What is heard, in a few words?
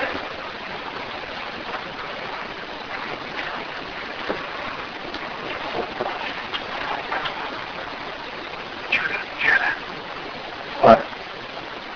footsteps